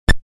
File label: Soft muted beep